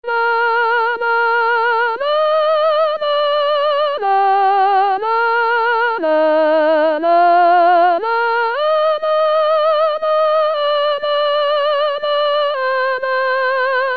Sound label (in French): la marche de sacco et vanzetti sopranes